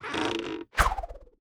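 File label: infantry archer, weapon; Battle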